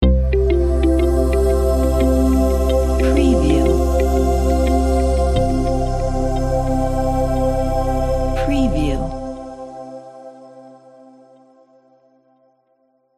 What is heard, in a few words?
corporate logo